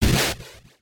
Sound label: attack